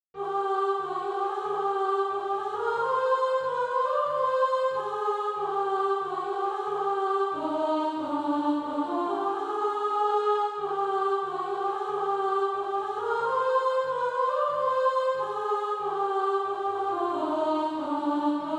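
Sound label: alto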